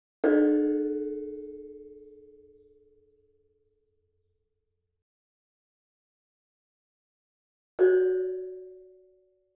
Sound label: Gamelan; Propeller Island - Complete Gamelan